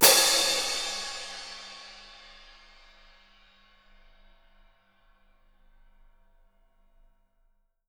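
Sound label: cymbal splash, right; Miroslav Vitous - Percussion & Harp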